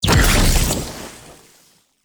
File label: Shrimp Lantern Weapon Projectile Burst; Creatures; Baliq